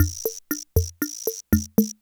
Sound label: Loop, classic machines, rhythm77